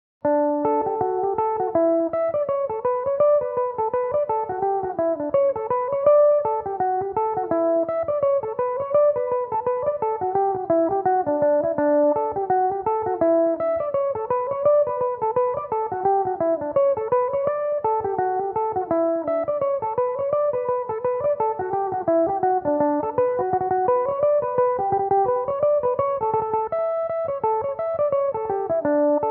D maj, mandolin; Soft Horse; Belmuse

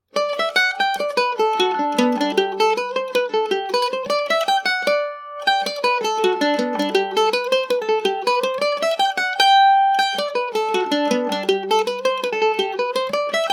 jig, mandolin; minaun

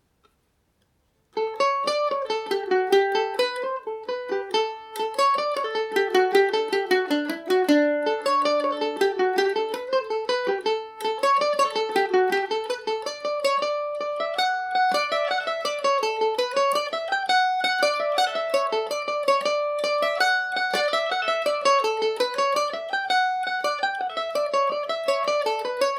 dmaj, mandolin; One Tree Hill; G&O #34 mandolin

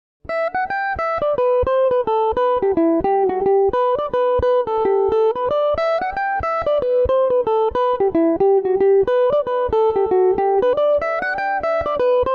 humours of lisheen gmaj mandobird

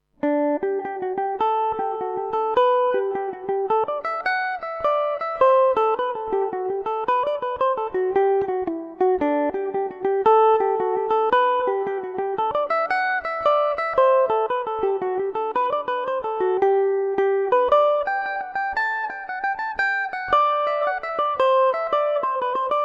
humours of bandon gmaj, mandolin; The Humours Of Bandon; Revelation Jaguar electric mandolin

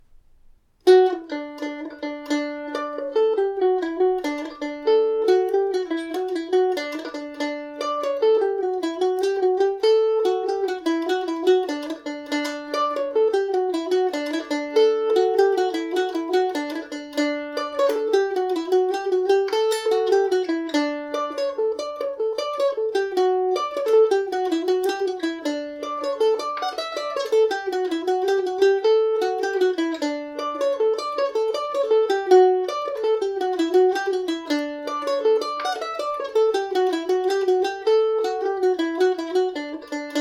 dmaj, mandolin

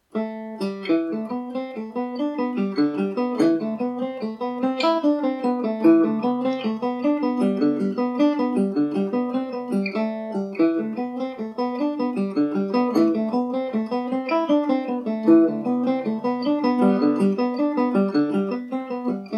dan molloys jig ador, mandolin

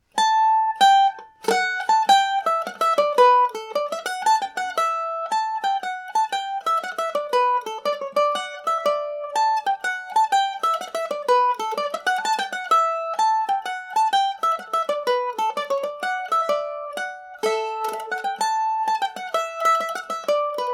jazzing with mag leary, mandolin

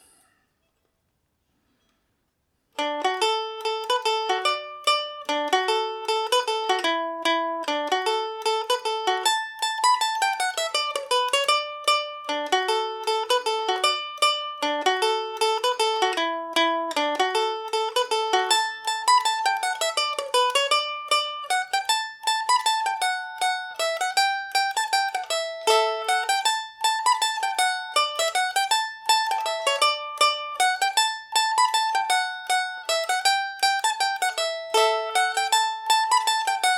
domra

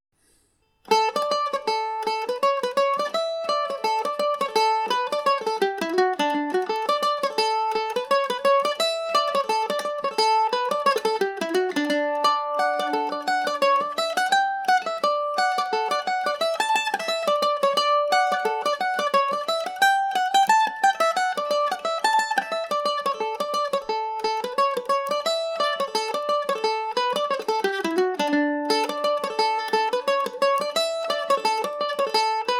session speed, mandolin; lilies of the field

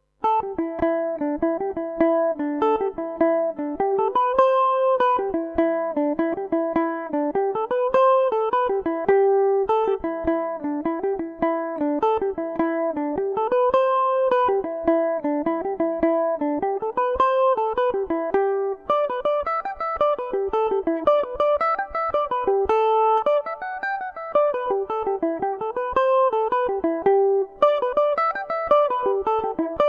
humours of ardmore gmaj, mandolin; The Humours Of Ardmore; Revelation Jaguar electric mandolin

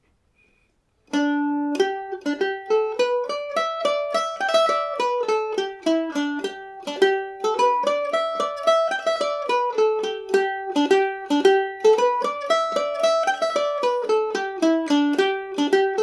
polka gmaj, mandolin

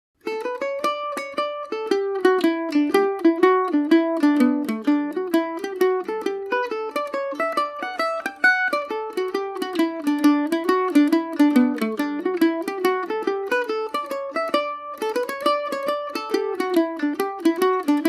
jolly little boy dmaj, mandolin

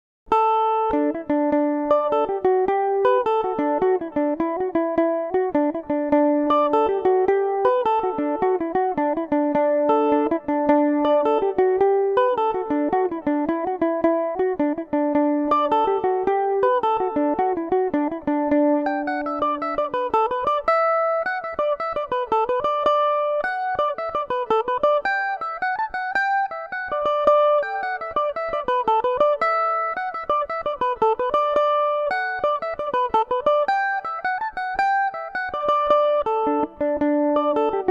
jacksons dream dmaj, mandolin

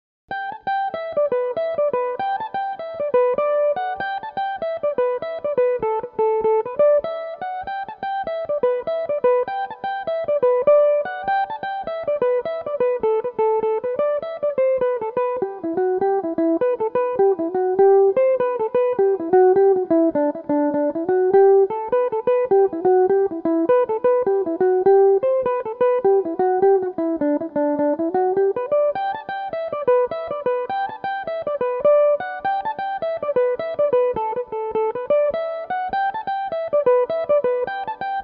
ten penny piece slip jig gmaj, mandolin; Belmuse EM-190 electric mandolin; The Ten Penny Piece

